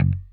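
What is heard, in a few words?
6 string bass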